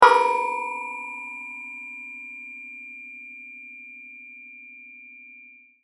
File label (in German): huhn